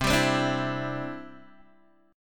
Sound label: C Major Add 9th, Guitar DADGAD